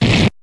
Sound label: Slash